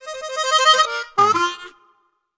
Country Blues, notifications; LG G3 Screen F490L

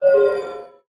Low Battery; HMD Pulse

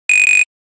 Buzzer; N8 Belle Vietnam

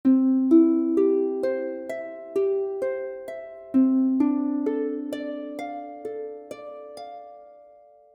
Harp; OnePlus OxygenOS 13; dynamic notifications

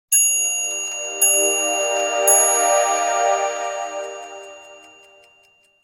Clock alert; Nokia; 7500 Prism; Alert tones

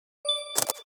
taking photo; Samsung Galaxy S10; AvatarEmojiSticker Beyond

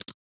Lock; Xiaomi Mi-CC9

